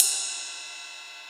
Yamaha CS6 B

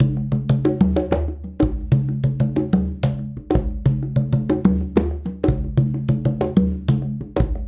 Congas; Essential Percussion

